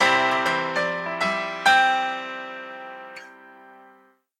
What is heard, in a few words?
SMS Warm Piano; Pantech; Flex P8010